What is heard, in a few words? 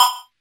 Percussion; Vengeance Samples